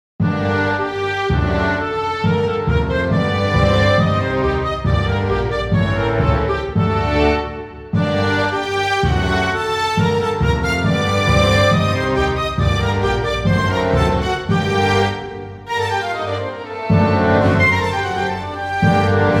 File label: orchestra; BGM; maoudamashii